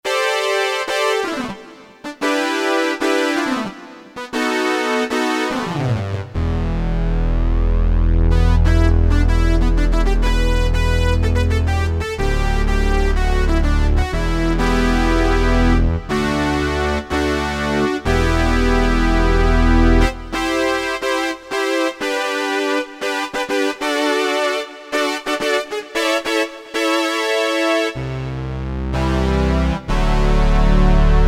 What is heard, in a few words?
Moog, Korg DSS-1 DWGS synthesizer; Korg DSS-1 Sound Library